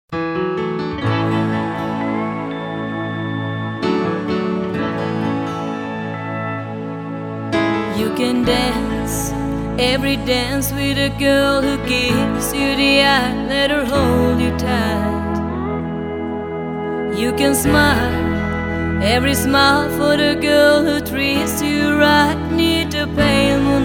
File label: Country Dance